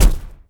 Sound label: footstep